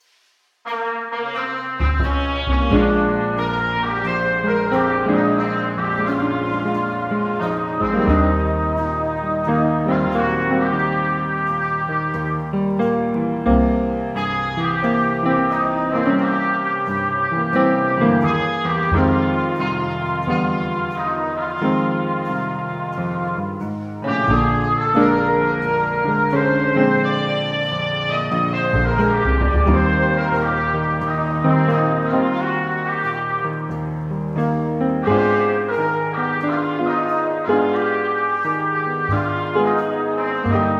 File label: Trumpet; Dusty Lady Trumpet